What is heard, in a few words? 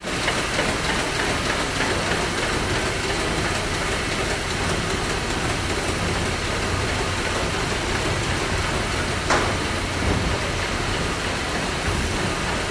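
steam engine warming, WWII Liberty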